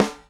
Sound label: Soft Hammer, Snares; Airwindows; BeyondSnares